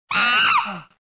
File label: chimp hoo; petz